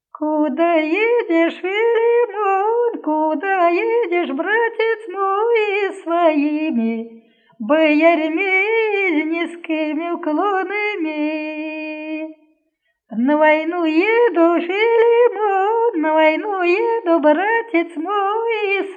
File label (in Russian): колядная хороводная; Куда едешь, Филимон